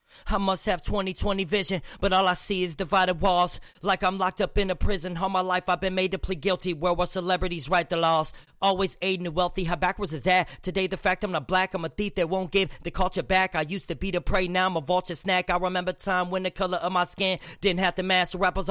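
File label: hip hop 142 F minor, Acapella; Male; Rapping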